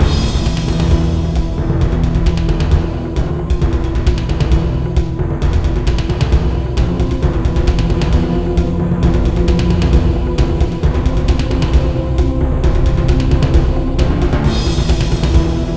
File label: Travel Song; War Wind 2; Overlord Travel Song